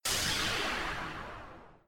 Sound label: ship explosion; eliasdb